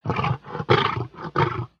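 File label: Pets Triceratops Neutral; creatures; Lego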